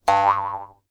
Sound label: cartoon spring bounce twang